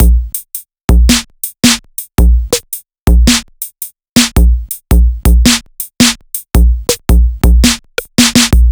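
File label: Distorsion Kit, downbeat electronica drums; ударные и барабаны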